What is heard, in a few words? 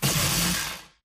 bullet energy titan arc; Titanfall 2